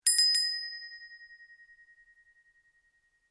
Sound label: Bell Brass Ring, Varied brass bells; Bells (Sound Effects:Musical)